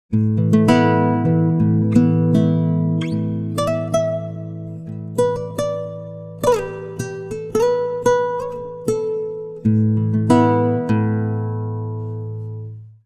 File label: guitar; timestretch; prosoniq time factory clear scale